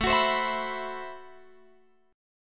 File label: A Minor 6th Add 9th A Guitar Open F; strummed; Am6add9